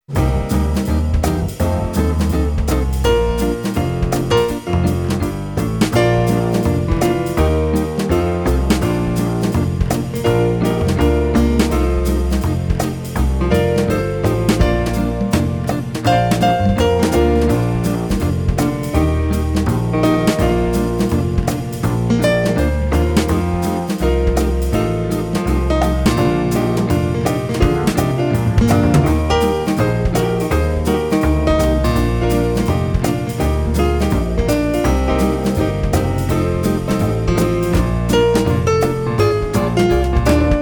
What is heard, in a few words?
Trombone to play along; Down By The Riverside